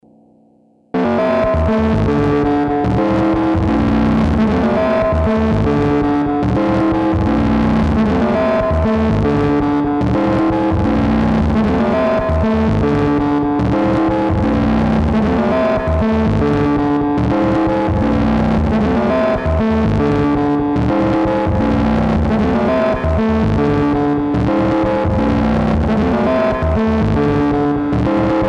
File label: Duck Toy, Circuit Bent Duck Toy; Circuit bends are unpredictable and this one plays 'Old McDonald' at the most inopportune moments